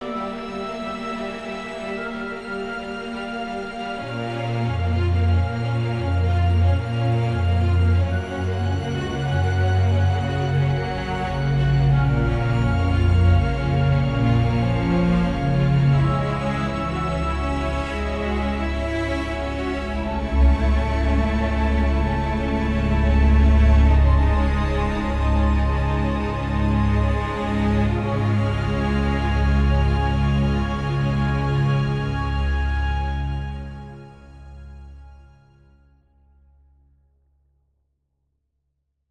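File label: Music Transition start Long; From Dust; PS3; Xbox 360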